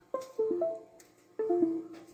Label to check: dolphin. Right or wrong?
Wrong